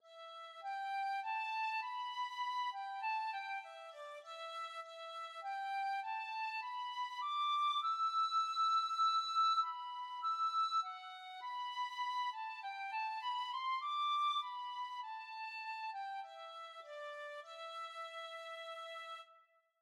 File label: tin whistle